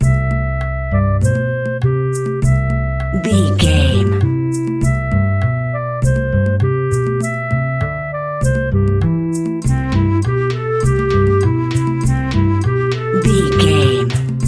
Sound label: music kids picnic fun loop